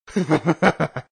Laughs; Sonic.EXE

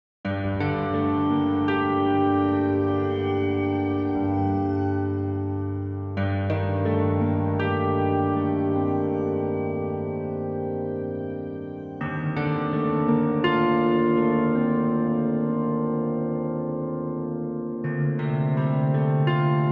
(Piano Rendition); No More Room In Hell

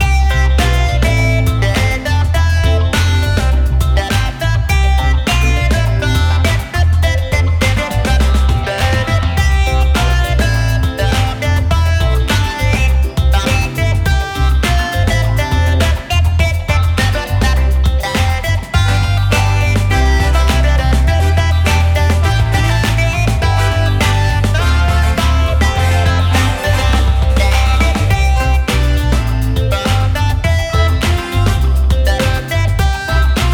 Menu Funk; Vrog; PS4; Wii U; Windows

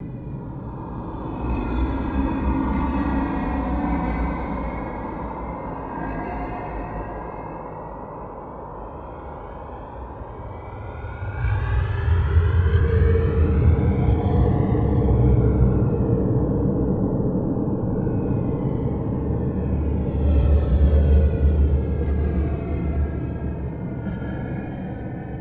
Amb Eerie Drone Loop; Lucius III

